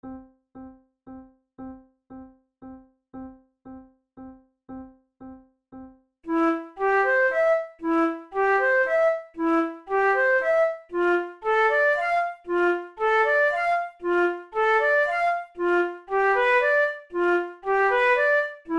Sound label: methode de flute; tulou